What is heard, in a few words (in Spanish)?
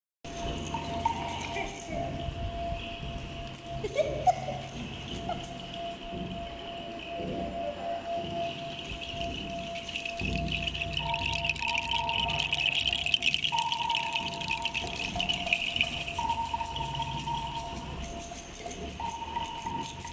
Registro de baño sonoro realizado en vivo